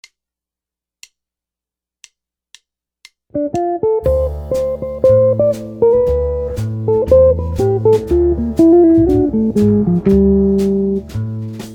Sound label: licks, jazz guitar